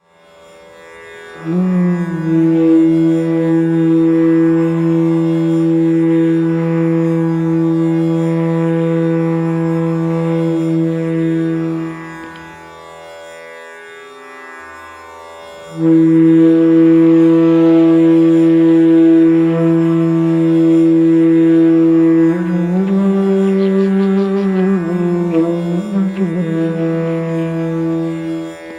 root chakra flute

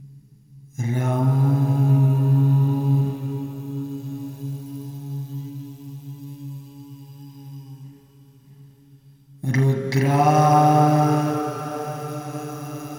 rum rudraaya namaha

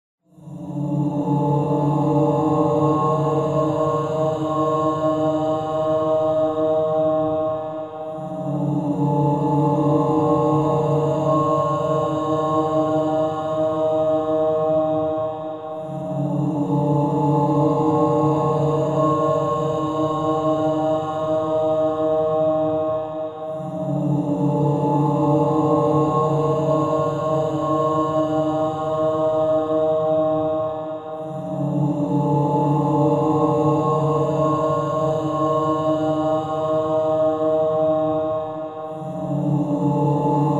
healing om

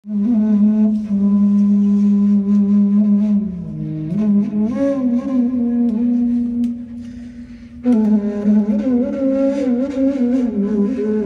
soul flute; raaga; deepavali; festival of lights

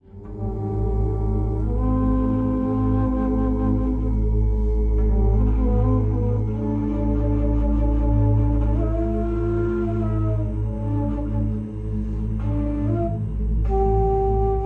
om shankh

